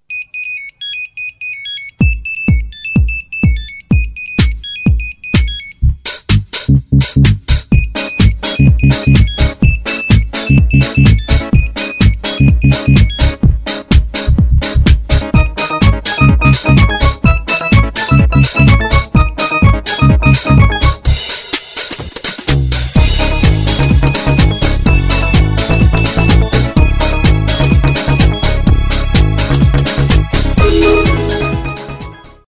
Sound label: Nokia Funky House Old Ring Remix; Nokia Mobile Ringtone